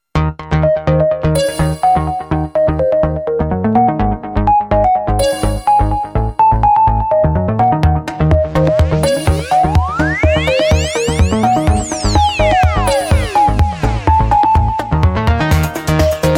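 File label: original ringtone htc rove; originalnaya melodiya zvonka htc rove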